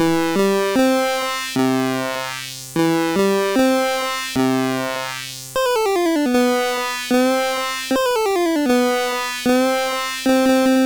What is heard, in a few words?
Sitar Demonstration, MOS 6581; MOS6581R4